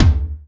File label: Tom